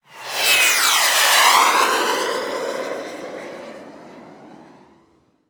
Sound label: Missile Fly By; Weapons; 1001 Sound Effects